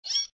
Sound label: AV rabbit short; Toontown Online